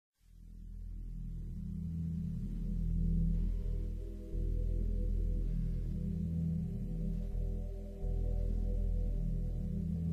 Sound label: loading screen music theme; grand theft auto gta v